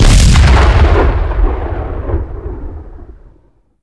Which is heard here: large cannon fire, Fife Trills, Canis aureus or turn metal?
large cannon fire